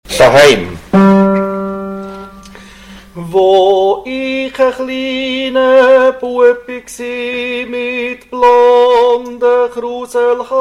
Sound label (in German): 2. Tenor